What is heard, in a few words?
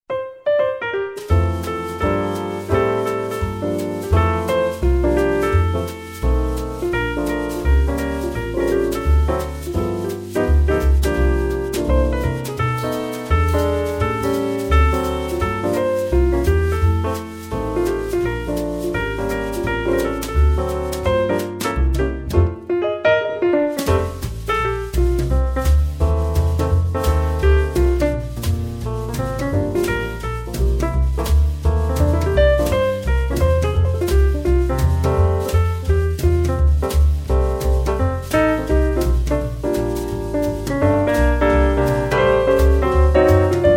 JINGLE BELLS SWING